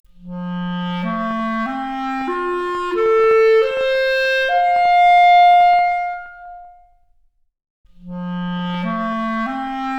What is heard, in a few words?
Clarinet arp, Stereo output noised; 3bit